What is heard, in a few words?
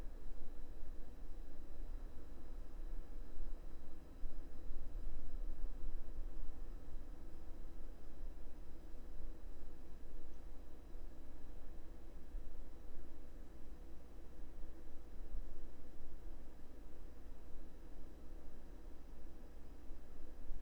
Ambient Room Hum